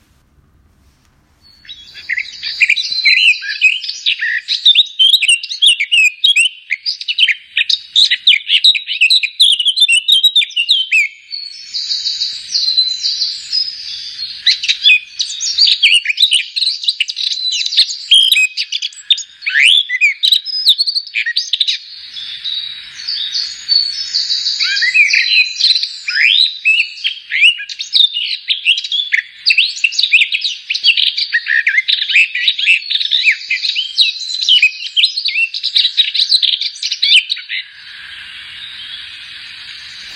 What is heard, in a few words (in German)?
Moenchsgrassmuecke, Vogelstimmen; Mönchsgrassmücke